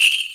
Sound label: Korg N5 Rock Kit C#3